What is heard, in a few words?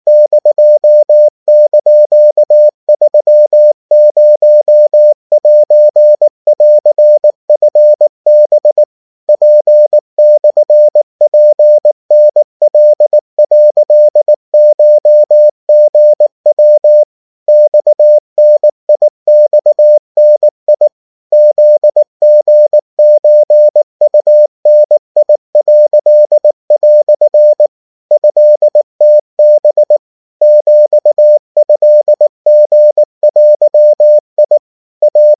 Japanese 70cpm